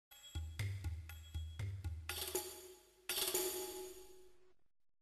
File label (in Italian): tensione